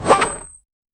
modern archery bow shot, arrow release

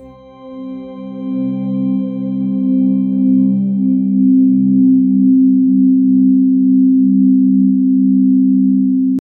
warm ambient pad soft low

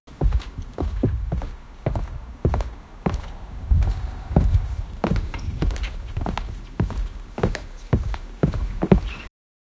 walking on a wooden deck

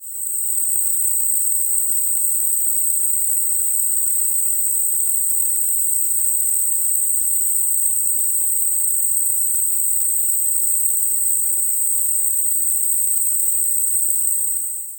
a deep powerful and rhyth, insect

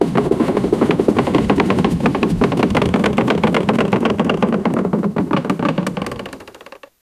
a swooshing sound

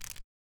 object

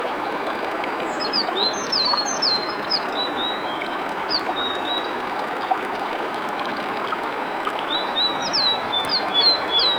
heaven birds and rivers, ambience

